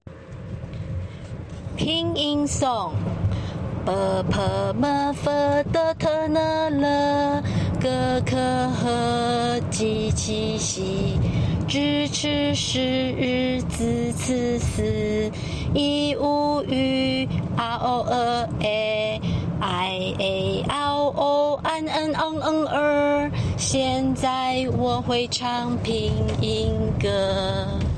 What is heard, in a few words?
pinyin song